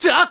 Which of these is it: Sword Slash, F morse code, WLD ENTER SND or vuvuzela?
Sword Slash